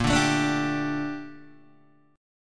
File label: A sharp Major 13th, Guitar Open D